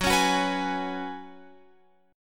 F sharp Suspended 2nd Suspended 4th, Guitar, Open F; strummed; x,13,13,13,14,15